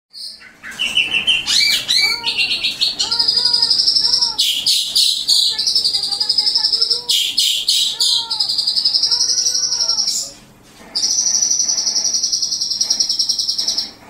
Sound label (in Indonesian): Suara Jalak Suren Nembak Isian; Cililin